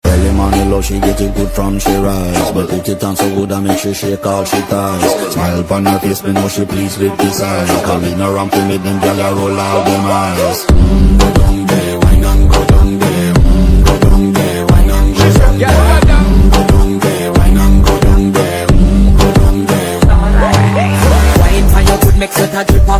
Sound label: Trending Reels Ringtone